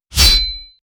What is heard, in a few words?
create a sound effect of, block